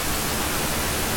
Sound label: static